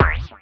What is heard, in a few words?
Noggin, Classic SFX, cartoon; musicradar; cartoon caper